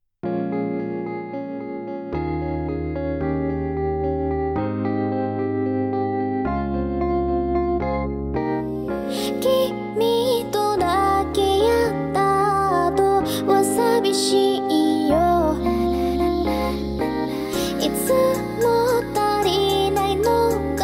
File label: Japanese Version cover